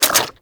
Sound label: Infested Vomit Gun Reload; Warframe